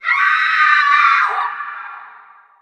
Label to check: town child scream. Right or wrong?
Right